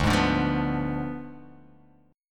D Diminished 7th D

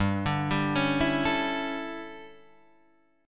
D Major 7th Suspended 2nd Suspended 4th

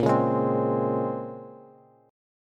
Bb Minor 9th Bb, Bass; Bbm9; strummed; 18,16,18,17; JGuitar

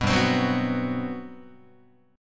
G Major 11th down Guitar; strummed; JGuitar

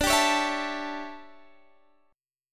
D Major 7th Suspended 2nd Suspended 4th, chord

